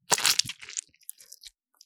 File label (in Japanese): 射精音